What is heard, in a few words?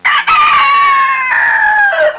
Crowing rooster